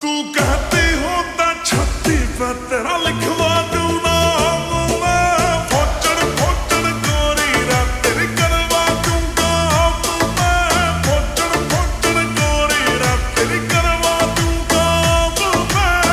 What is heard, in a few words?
Ringtone